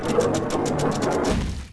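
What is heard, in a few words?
elevator up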